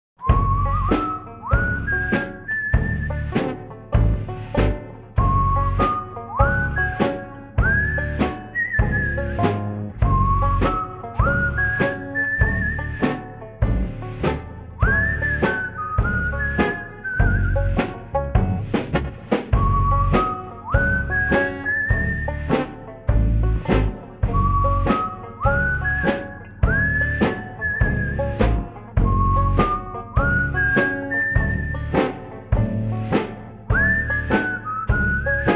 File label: whistler